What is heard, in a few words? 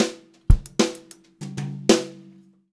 fill, Brushed Drum Loops jazzy 126; USB Soundscan vol.39; AKAI